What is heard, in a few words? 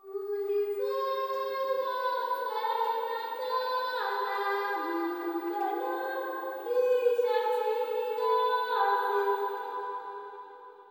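LITTLE GIRL, AFR. GIRL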